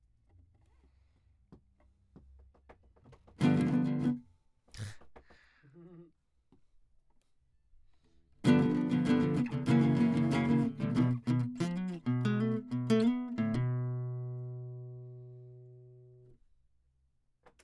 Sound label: Classic Guitar